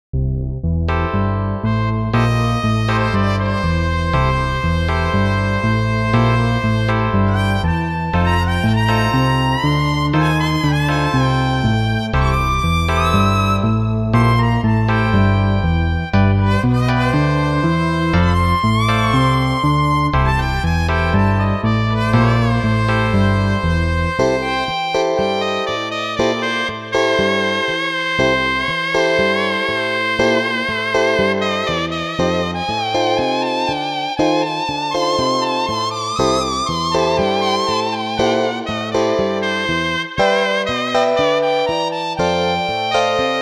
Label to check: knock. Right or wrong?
Wrong